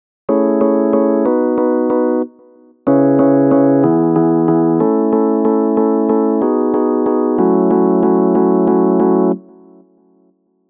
Drop

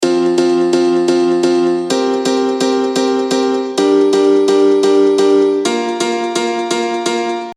Arty chords

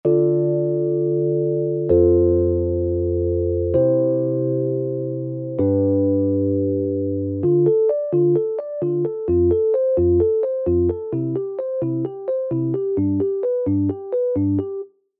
arp

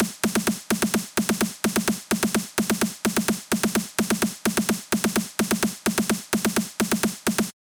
Roll

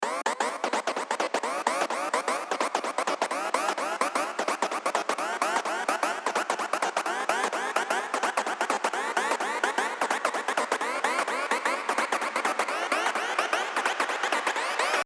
rhythmic rise

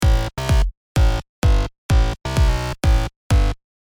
New Melody

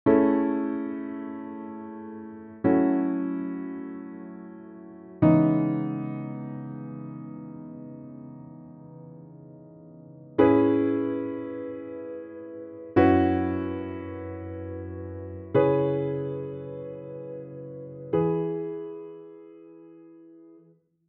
Verse Intro

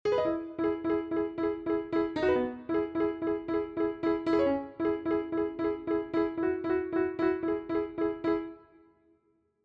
Chorus Melody

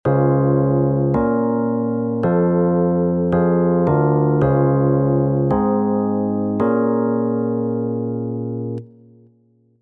chords full

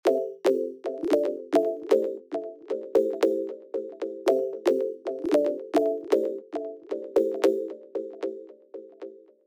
no ducking

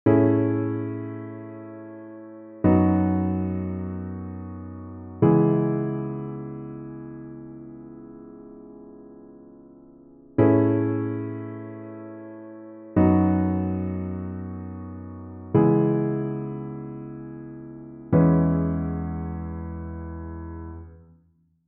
pre-chorus

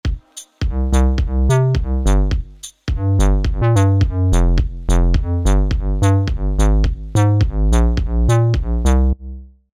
Bass

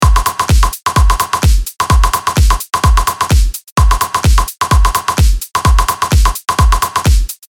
perc basic

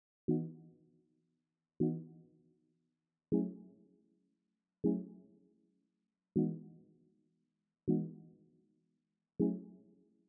Chords

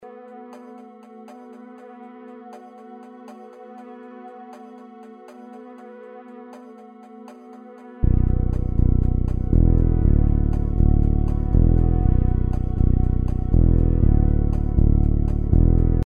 Chord Spectrum

